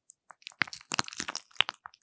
挿入; ASMR

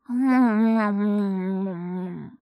モグモグ

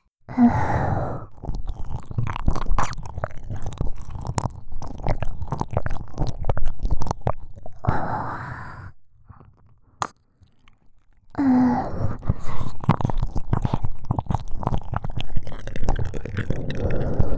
とろとろ生耳舐め・左右同時責め、カウントダウン射精（射精音あり・耳舐め+脳内カウントダウン）

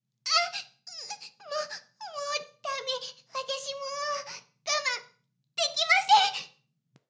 Ｈな音声; ASMR; ダメなメイド

